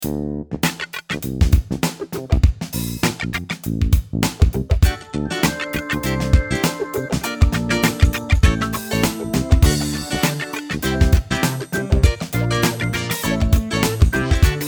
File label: funky